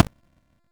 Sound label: Journey Screams; Dante; Warframe